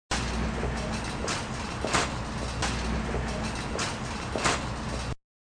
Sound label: Grcy Crt Short, Short sound of grocery cart in parking lot.; Stores and Malls